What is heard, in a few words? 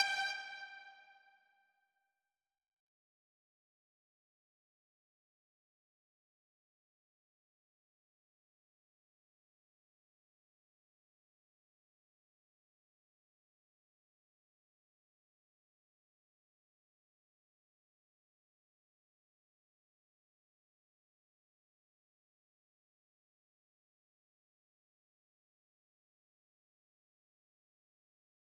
Breakout Frantic Mix Stem Layer; Breakout Recharged